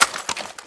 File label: weapon pickup